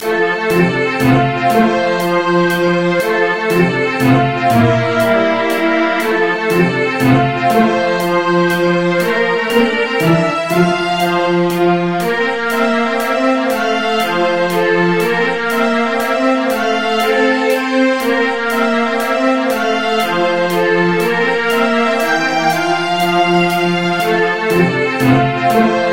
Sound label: Waltz